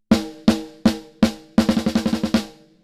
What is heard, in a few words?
snare Drums Snare; smart:comp 2